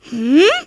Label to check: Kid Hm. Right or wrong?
Right